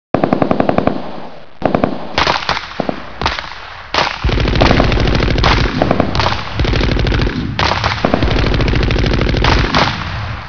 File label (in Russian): perestrelka